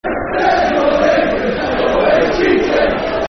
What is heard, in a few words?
English Cheating Football Chants (Soccer Songs), Cardiff City; fanchants-com